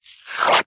foley liquid mostly foam froth bubbles suck in squelch